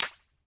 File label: gem hit